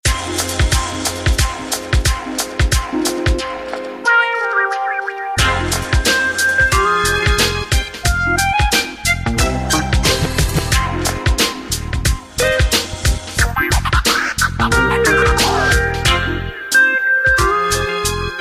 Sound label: samsung galaxy nexus ring